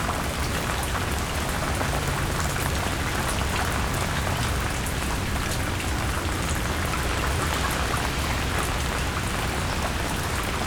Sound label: city rain